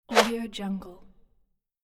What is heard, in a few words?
swoosh; audioheart